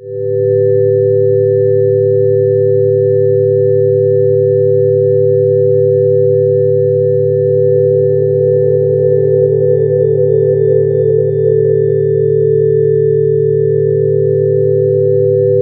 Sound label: CANDLES, ORGANST, Ominous Drones; USB Soundscan vol.48; AKAI